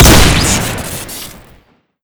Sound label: r870 shoot crit, weapons; tf2classic